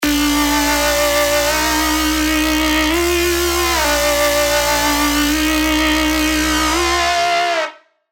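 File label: LD, lead; bass tech house; Calling Out; revealed serum 2 bass tech house vol 1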